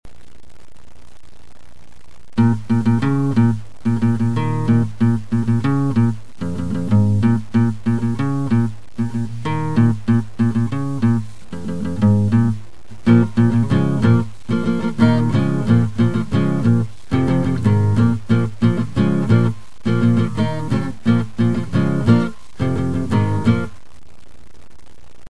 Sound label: Western, acoustic